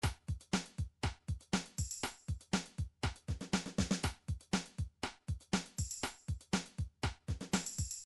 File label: drums